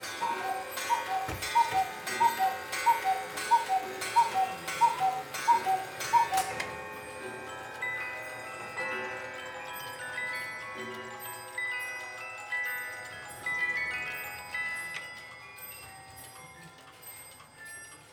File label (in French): pendules; bianchi